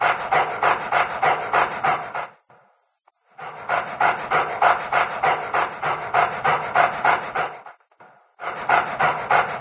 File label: panting, dog; There; ThereClient